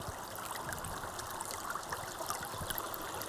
Waterway G Dark

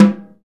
SNR SNARE, Orch Snares; Roland LCDP03 Orchestral Perc; 90 s Sample CDs

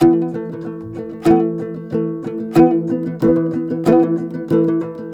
TOPSHUR; Soundscan vol.60; Asian Traditions; AKAI

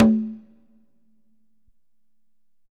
Congas wet, drums percussion

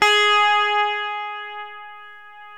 GTR CH STRT, Clean E.Gtr, Death Strat; Roland L-CD701; Clean E.Gtr5

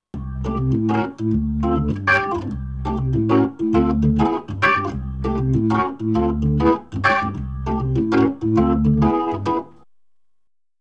B3 Organ; Masterbits CLIMAX 8

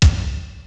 W7 beat kit; Rubber Chicken Software Sample Bank

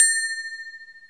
AGOGO HIT

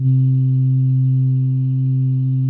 MELLOW ST C2, SYN STR

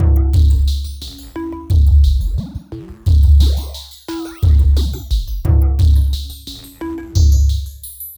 Beat Blip; Sonic Foundry (Sony Creative Software); Ma Ja Le Ethereal Textures; Beats

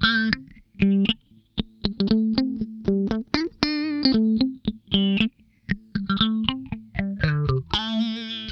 Funk Guitar; 90 s Sample CDs; Zero G

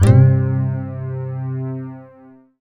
SYN, Roland JD 800; Sound & Vision - Gigapack I CD 2; JD 800/990

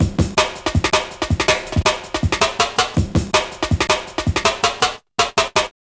Cool Break, Drum Loops, Jungle, 162; Jungle Warfare 1&2

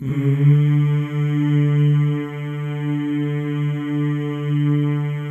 male; Spectrasonics; Symphony of Voices

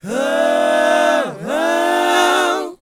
BOYZ A#, Vocal Planet R&B & Dance; ILIO

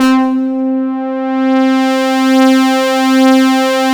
SYNH, SYNBRASS; Synthesizers

